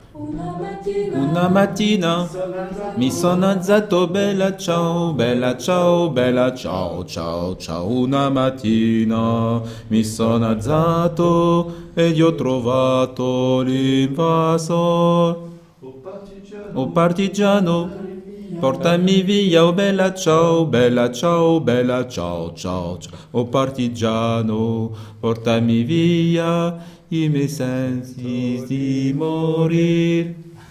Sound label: bella ciao basse